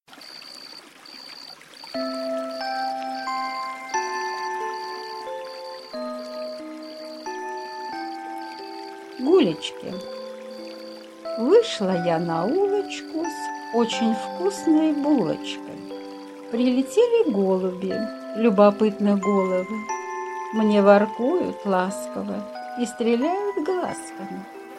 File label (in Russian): Колыбельная природы. Сказка ночи